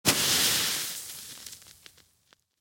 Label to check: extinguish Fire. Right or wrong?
Right